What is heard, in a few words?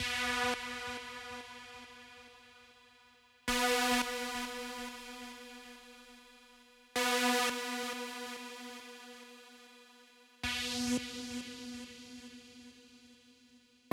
Soothsayer Kit 138 BPM Tiny Pad, B minor; Melody & Synth